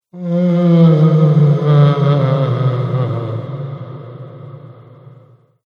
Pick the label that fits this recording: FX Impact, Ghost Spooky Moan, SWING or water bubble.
Ghost Spooky Moan